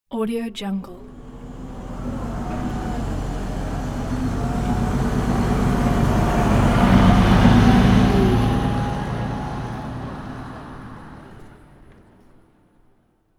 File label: bus driving away passby